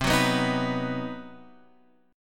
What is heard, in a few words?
C Minor Major 7th Flat 5th chord, Guitar Open F; strummed; JGuitar